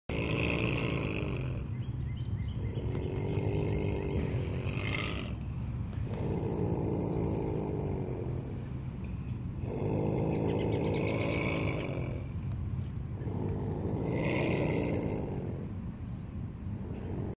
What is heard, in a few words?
opossum; صاریغ